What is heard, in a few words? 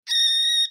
baby chicken dieing; el-pollo-loco